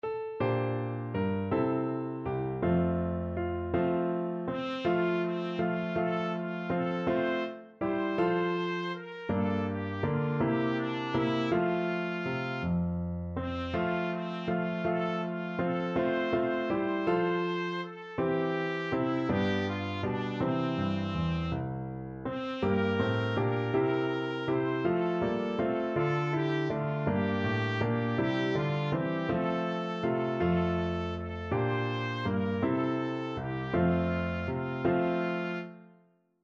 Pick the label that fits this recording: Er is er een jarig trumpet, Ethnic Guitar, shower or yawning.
Er is er een jarig trumpet